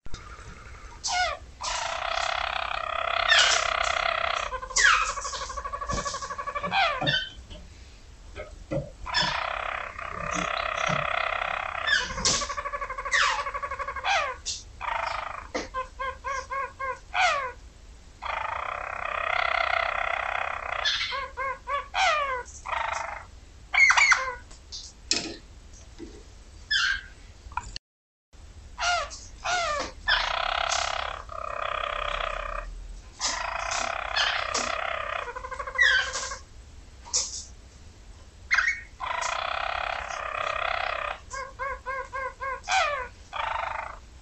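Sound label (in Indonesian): kenari roller, canary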